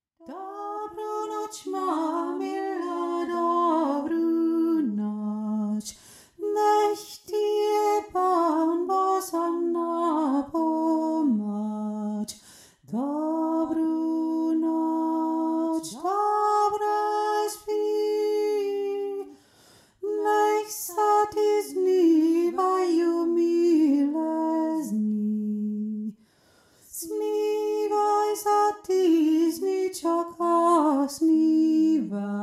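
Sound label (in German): hohe stimme, jodler; dobru noc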